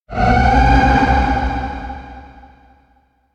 elec whale maddened cry; anemoi

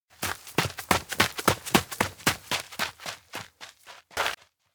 steps outdoor forest dash; koyomi; anemoi

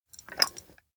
jar lid open generic; anemoi

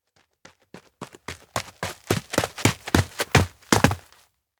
steps run; run toward; chiharu; anemoi

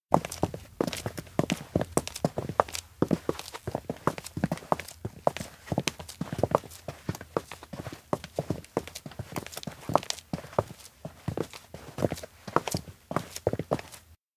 steps outdoor road walk; mugi awayuki tsuzura; anemoi

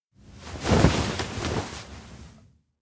futon wake fast; anemoi

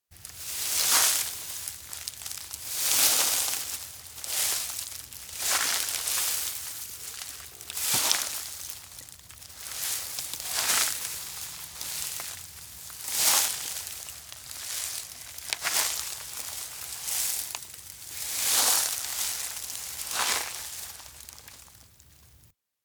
steps night outdoor grassland walk; lured; anemoi

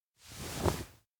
palm extend stare; anemoi

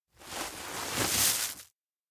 bag takeout sandwich; anemoi

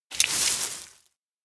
dog poop bag; anemoi

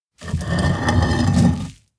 stone mill grind; anemoi

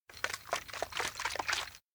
shaker shake behind; anemoi

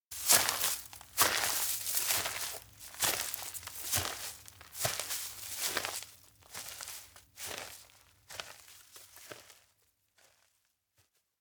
steps outdoor grassland trudge; chiharu; anemoi

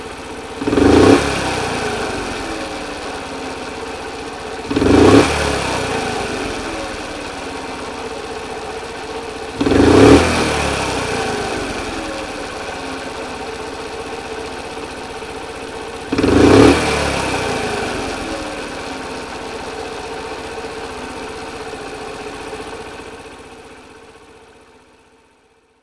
cub accelerate; anemoi

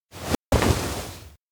hug embrace; anemoi

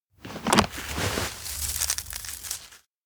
bugcage take beetle; anemoi

